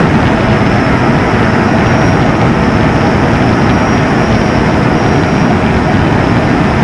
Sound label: me163 on med, engines; War Thunder